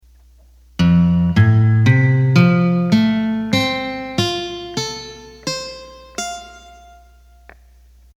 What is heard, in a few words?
String Guitar, accordion